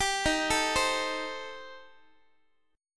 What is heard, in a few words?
D sharp 6th Flat 5th, D sharp, down, Guitar; D#6b5 arpeggiated; x,x,10,13,13,11